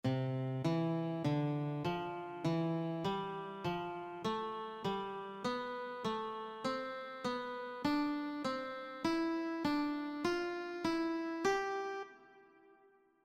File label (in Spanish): escala do